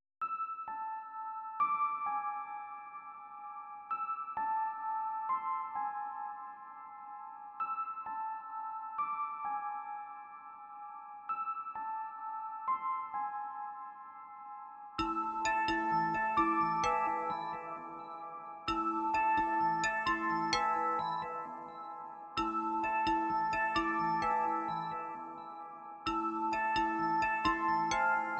High Melodic Elements

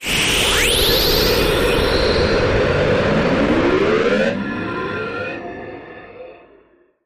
Space Warp Index

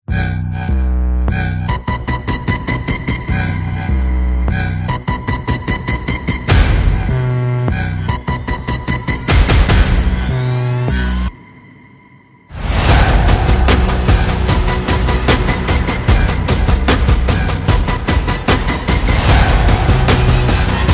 No Lead Vocals

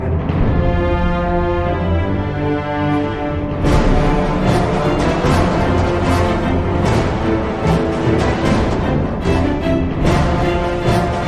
No Choir

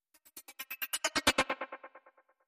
Delay Glitch Riser

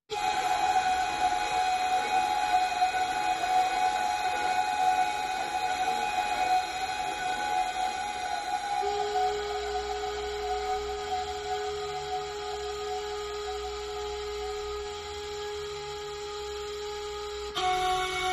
Flutes Only

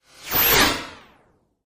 Energy Sweep

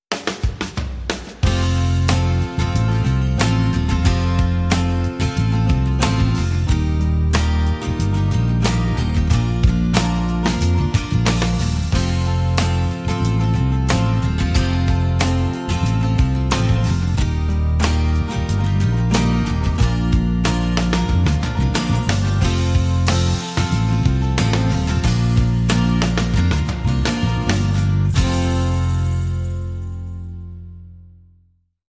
No Piano & No E Guitar

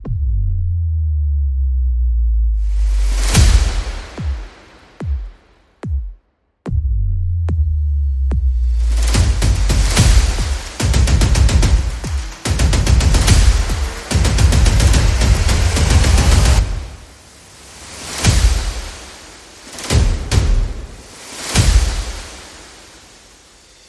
Percussion and Effects

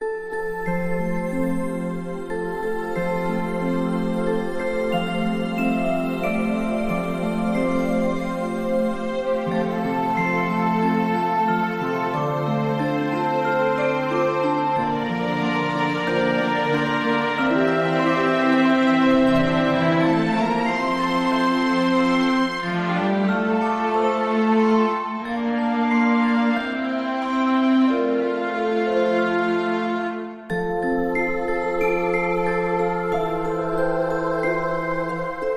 Sweet Dreams Lullaby

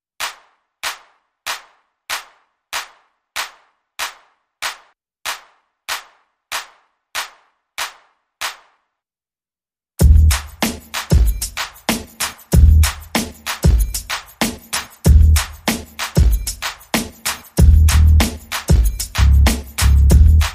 Drums and Bass Only

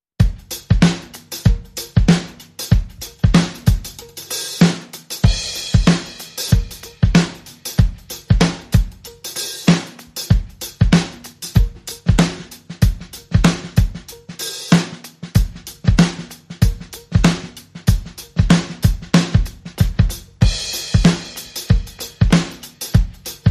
Sneaky Funk, Drums and Perc Only

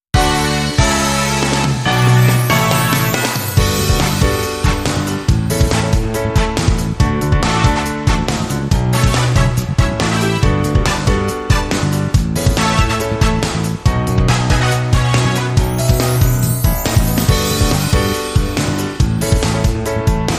Blowing Wind, Underscore

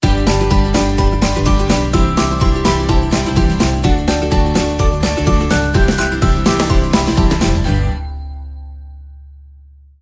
Stinger

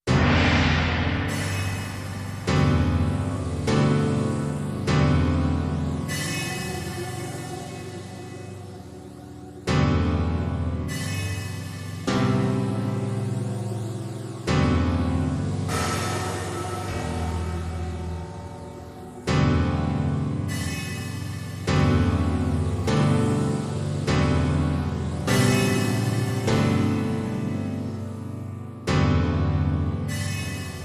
Dead World Drone

